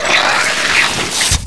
brain idle

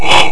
bump; hydralisk

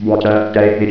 gurp; vortex; smasher

